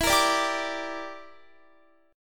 E Minor 7th Flat 5th E, Guitar; Em7b5; strummed; x,19,20,19,19,21

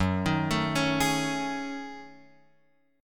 F sharp Minor 6th Add 9th, Guitar, Open F; arpeggiated; JGuitar

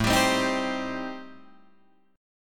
A-Minor 7th Flat 5th, Guitar Drop D, chord

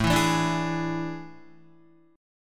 A Major 7th Suspended 4th, Guitar; strummed

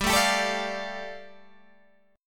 G 7th Suspended 2nd, Guitar Open G

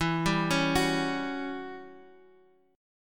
E Minor 6th Add 9th guitar chord, Half Step Down; Em6add9

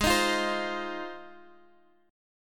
G sharp 6th G sharp down Guitar Drop D, chord

